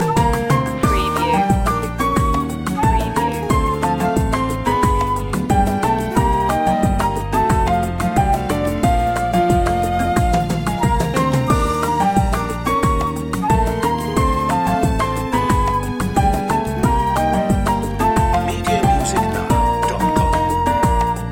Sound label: bossa rossa loop